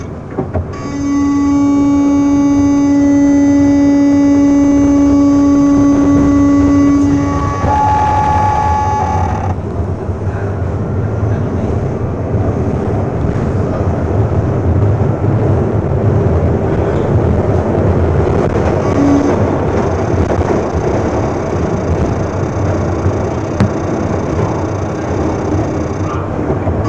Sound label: pwm